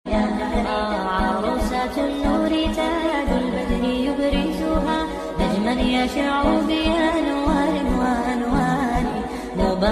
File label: islamic ringtone naat ringtone urdu arabic ringtone